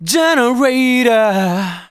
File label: vocals sung F#; Techno Trance Essentials